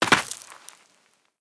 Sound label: fowl fall; Immortal